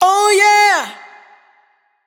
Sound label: adlib Oh Yeah wet, vocals; Slate Digital Omnivox Vocal Sample Pack; Adlibs